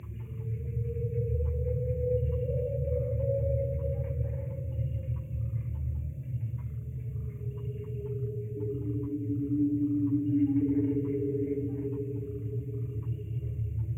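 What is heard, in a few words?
Ambience Swamp Base low; Griftlands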